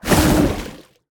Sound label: lobby victory; Smite; Sol